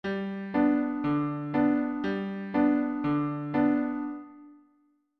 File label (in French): mini-pompes sol majeur